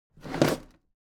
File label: object drawer packed smooth open heavy halfway; smartsoundfx